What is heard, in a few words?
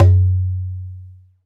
PRC CONGA, Roland; Northstar - Drumscapes Roland; Bonus Perc; Congas